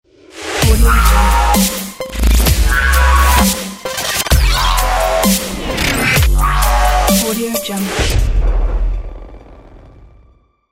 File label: dubstep glitch logo